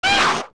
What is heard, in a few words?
Attack; Lizalfos; zelda; OOT